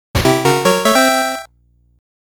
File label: Gameover; Darius 30th Anniversary Box